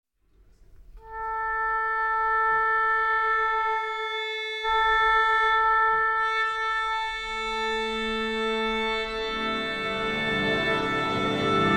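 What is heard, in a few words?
Orchestra Tuning; Nodame Cantabile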